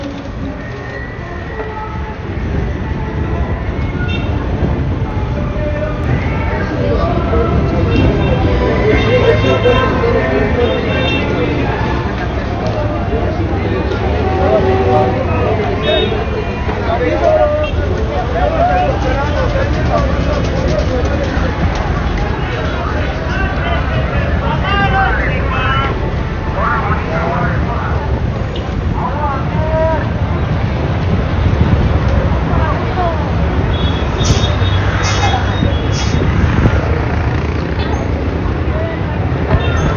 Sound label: Streetscape